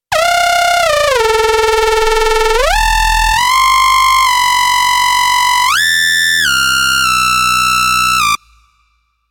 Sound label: Party Lead; KOPRO+